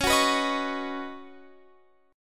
Db 7th Suspended 2nd Suspended 4th, Guitar, Open F; x,20,18,18,18,20; Db7sus2sus4 strummed